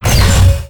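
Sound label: crane machinery damage fly; FlyForInsanity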